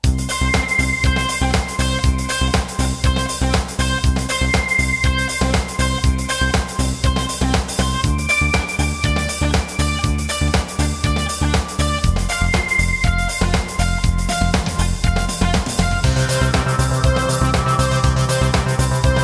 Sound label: Tekno